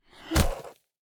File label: zombie strike; Shelter